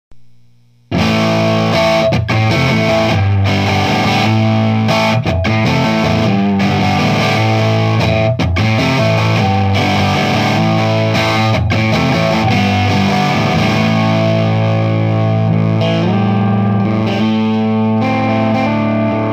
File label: 18W plexi; trinity